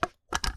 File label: fusecap open, subway trains; MetrostroiAddon